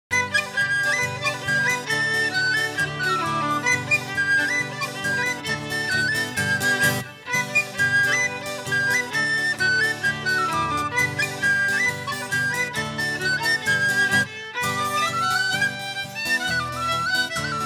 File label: POLKAS; The Murroe